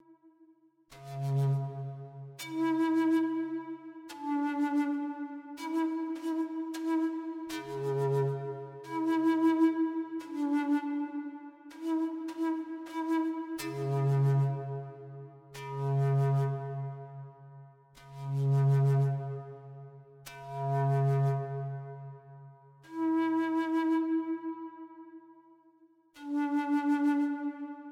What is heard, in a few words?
native american flute